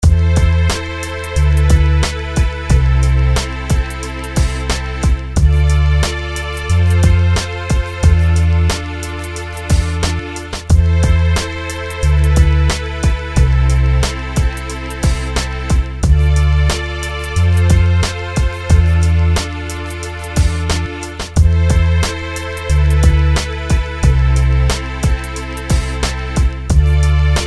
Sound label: beat